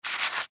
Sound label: scratching